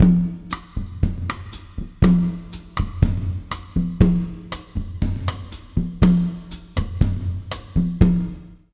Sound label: 120 W side, jazz latin drumloops; USB Soundscan vol.08; AKAI